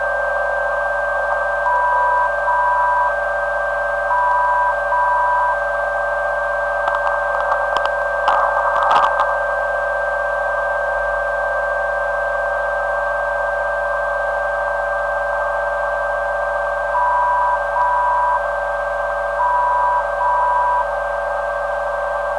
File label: MM 485.58kHz